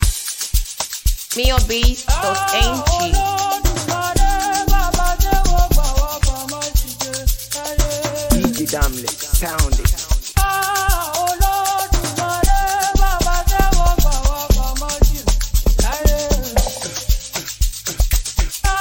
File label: Amapiano